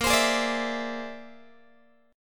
Bb 9th Flat 5th Bb down Guitar 4A,4E,5A,5D,5G sharp,6C sharp; strummed; JGuitar